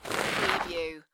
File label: Snow and Ice, Slide on snow; Weather sound effects